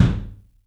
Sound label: ROOM KICK; 3D Drums